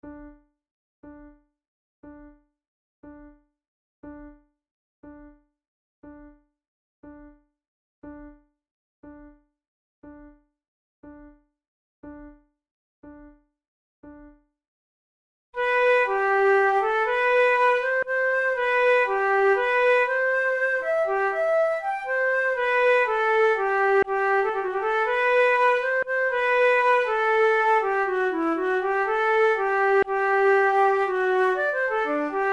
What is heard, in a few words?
duet, flute